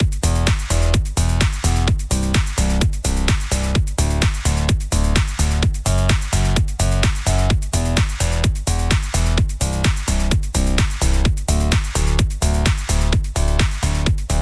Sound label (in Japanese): Beat Bass, リズム隊にシンセベースを絡めると（Bass Hi、Bass Mid、SubBass）; シンセベースは中音を強調したサウンドと、高音を強調したサウンドを重ねてステレオエンハンサーを、更に低音部分のSubBassも加えて3つのベース音で構成されています。